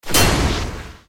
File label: powerup summon strike